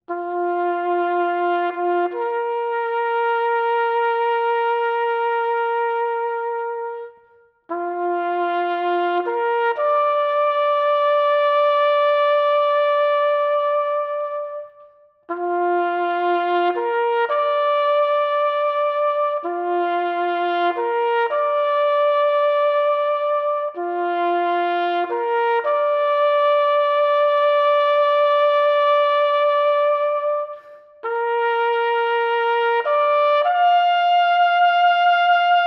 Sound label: Taps